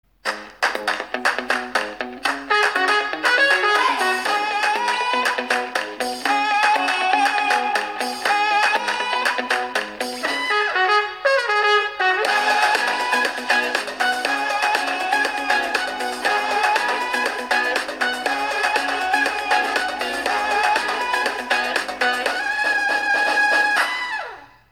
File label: shelf ad music; Supra